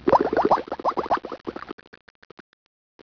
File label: UNWATER; TYRAN